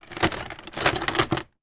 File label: household cd case put into full rack; sound effects one